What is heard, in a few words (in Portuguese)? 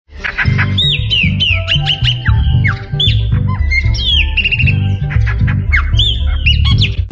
passaro preto ringtone